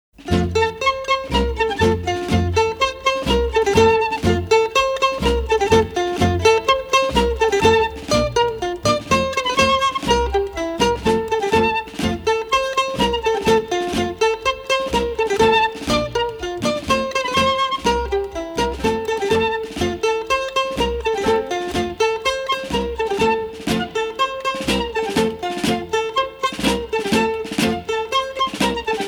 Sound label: Russian Dance